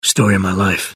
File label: jeers